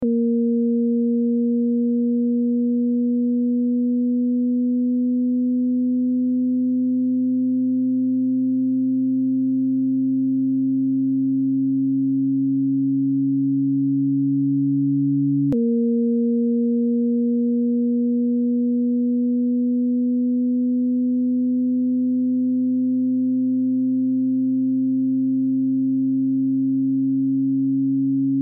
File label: Sine